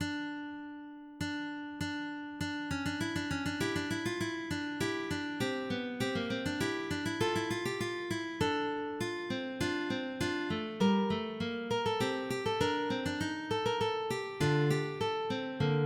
Praeambulum, MIDI

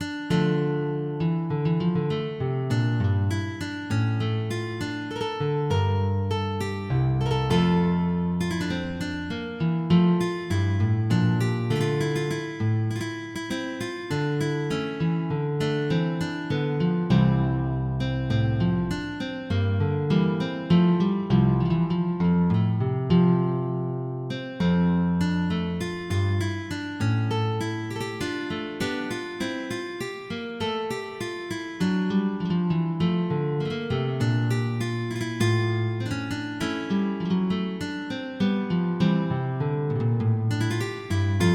Gigue; de Gaultier

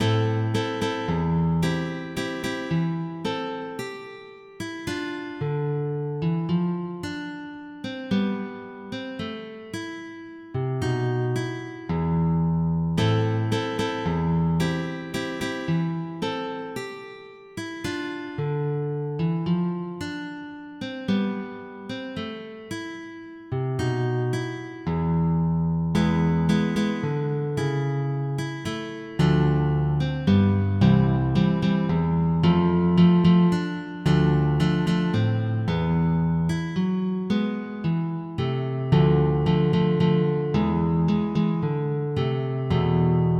Sarabande, MIDI

